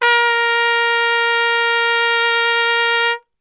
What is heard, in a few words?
A#, Brass; Best Service; Brass Super Section; TRP STRGHT